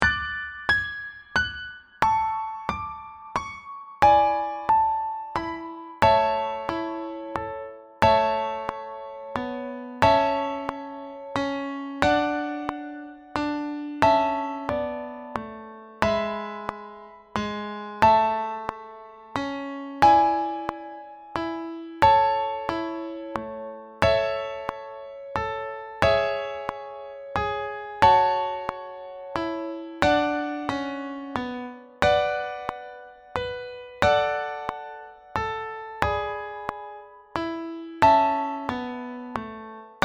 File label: French Folk performance